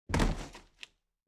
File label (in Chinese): 撞到柜子